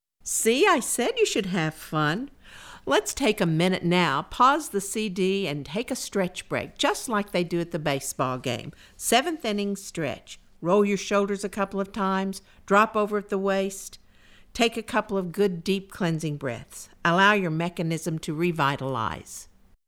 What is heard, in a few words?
Stretch Break, warm-ups